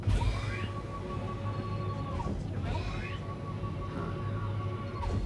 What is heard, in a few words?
Door; trek